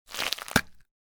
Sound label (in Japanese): zonbi